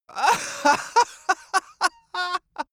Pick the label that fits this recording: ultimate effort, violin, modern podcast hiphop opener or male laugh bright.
male laugh bright